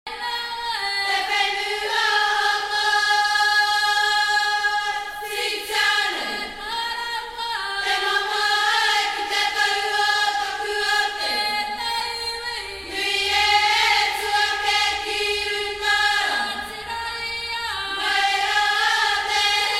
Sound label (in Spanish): NUEVA ZELANDA Canción tradicional Maorí